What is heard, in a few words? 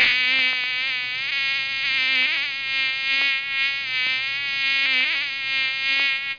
Fly; How Many Bugs in a Box